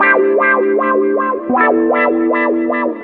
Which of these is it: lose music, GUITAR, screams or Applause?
GUITAR